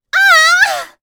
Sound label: Female Fight Death; mighty party 2017 gamerip pc ios android